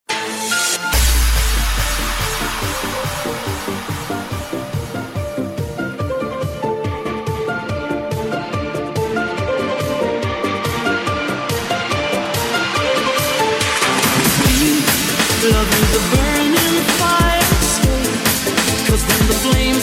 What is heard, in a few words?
Remix